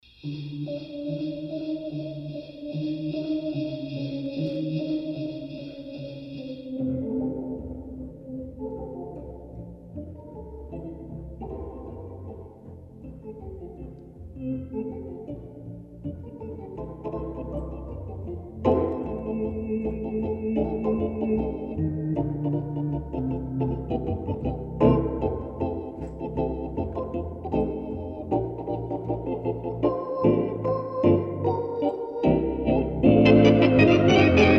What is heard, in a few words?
Jingle Bells; This Time Between On Christmas Eve program